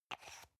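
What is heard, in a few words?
toothpaste close hard, Bathroom; haus-garten; badezimmer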